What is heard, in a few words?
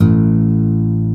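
A0 MARTIN, acoustic guitar; AKAI S6000 CD-ROM - Volume 1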